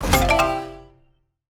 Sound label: explode wild